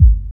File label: DR. LOOP, DRUMS; X-Static Goldmine 3